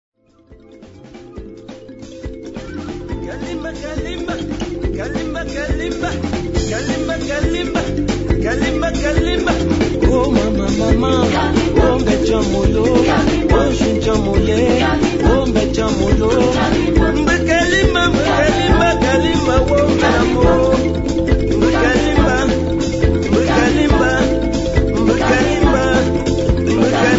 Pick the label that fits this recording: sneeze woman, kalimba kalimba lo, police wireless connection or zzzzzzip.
kalimba kalimba lo